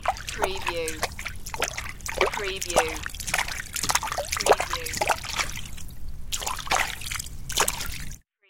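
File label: Water Footsteps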